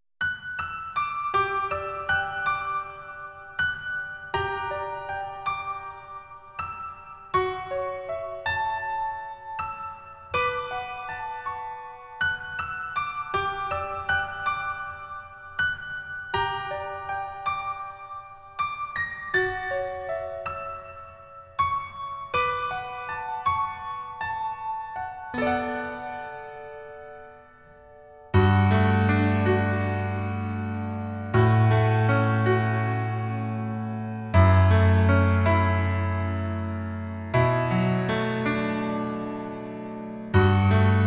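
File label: Piano version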